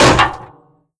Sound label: vent step, headcrab reviver, creatures